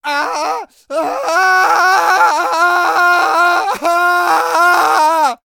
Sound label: npc male scream first; DeadFright